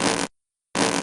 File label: tug; uzukumaru